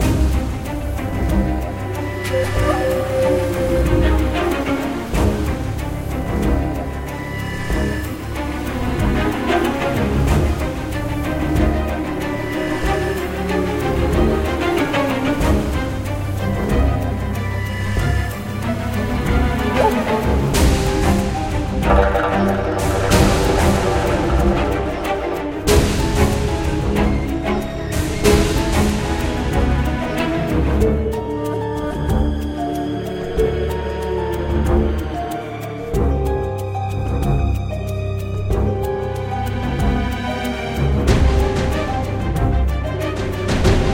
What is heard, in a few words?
Tension; Faith of Danschan